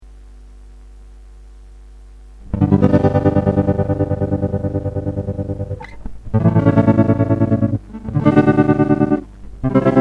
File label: maestro ring mod